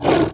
Wildcats Tiger Roar

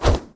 Shield Sentry Sword; gamerip; Ruin

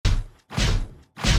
Walk; starbites

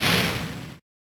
Fire

